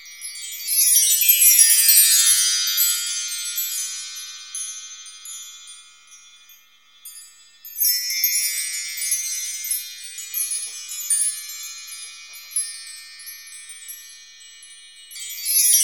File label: WINDCHIME; Zero-G Ambient 1; WIND CHIMES